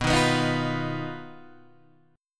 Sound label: B Major Flat 5th B, chord; BMb5 Chord {7 6 9 8 6 7} strummed